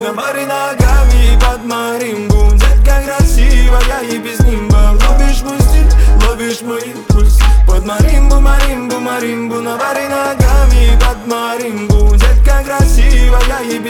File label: marimba